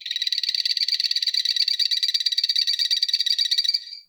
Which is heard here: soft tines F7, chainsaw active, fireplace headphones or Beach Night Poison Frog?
Beach Night Poison Frog